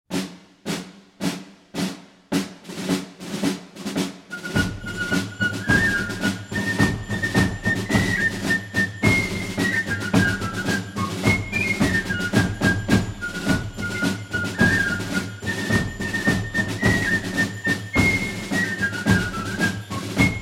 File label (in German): Marsch